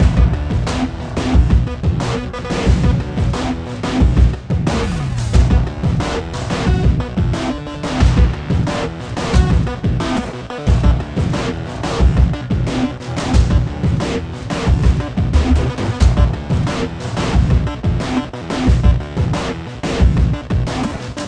Industrial Combat; LAYER 7

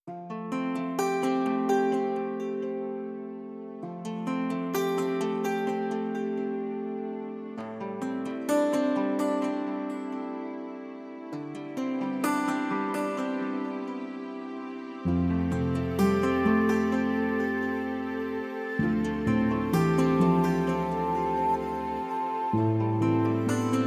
Slow Sigh; heart of the woods original soundtrack; snowfall

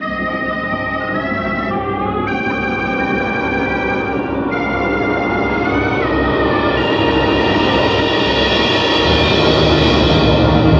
Alien Horror Music; Spookware

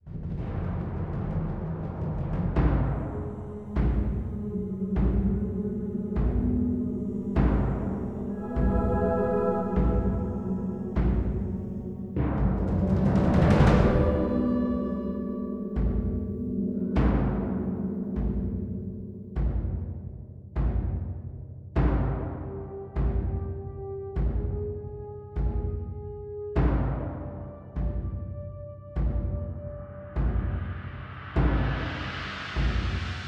Ambient Mysterious; rwby grimm eclipse

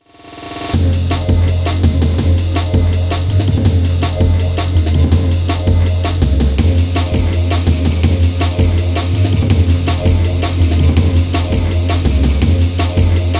Floor Clear; sanvein psx

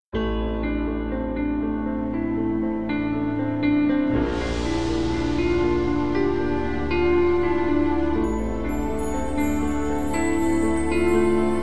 music ghost

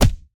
Player Attack Hit

